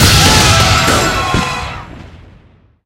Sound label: big Win End; wild wild west